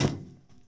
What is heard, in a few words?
catwalk step; Golden Crayon Sounds